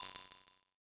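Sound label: bell; suzu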